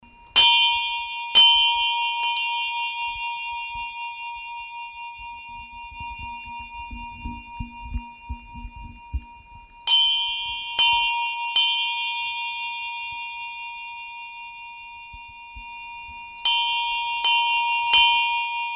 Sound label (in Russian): kolokol